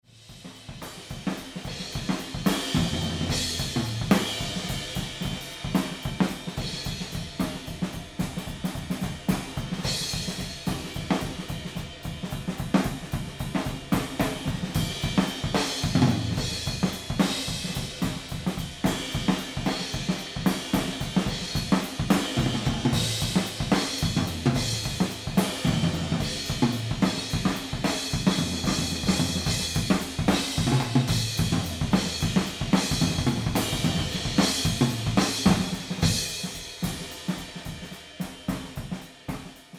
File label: Mix Drums Room Pan